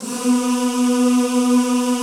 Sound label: ZZZ B2, Classical Choir; Best Service; Extended Classical Choir